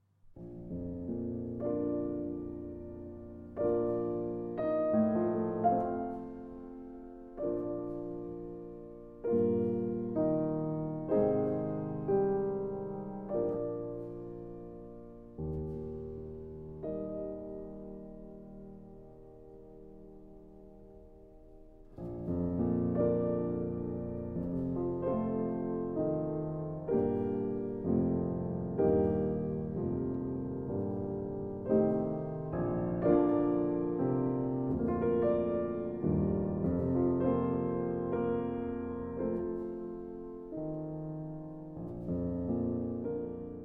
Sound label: Chorales; 6 Schubler Chorales; Ach Bleib' Bei Uns, Herr Jesu Christ, BWV 649 (Arr. R. Vaughan W